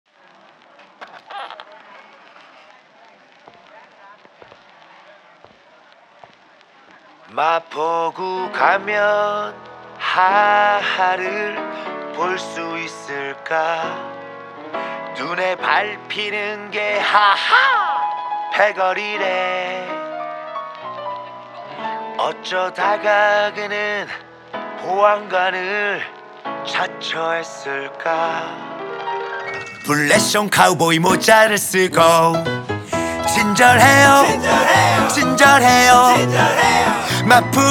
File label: violin